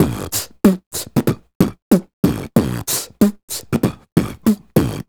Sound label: Beat Box, Hip-Hop and RnB Vocals